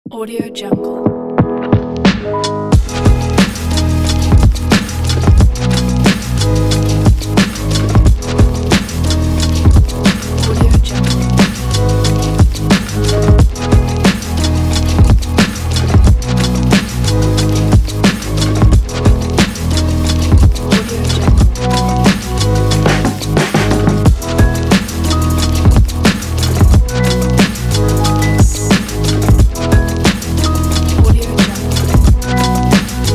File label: the trip hop